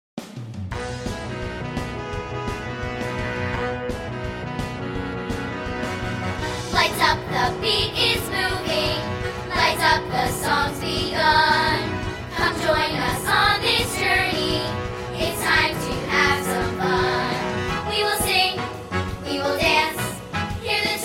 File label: kids for a cause theme song guide vocals short intro